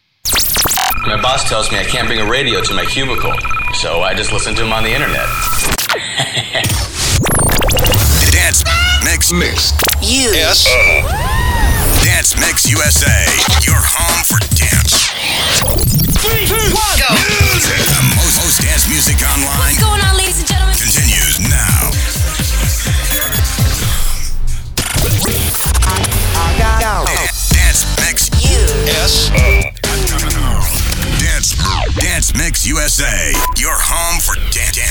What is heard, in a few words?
imaging showcase; dancemixusa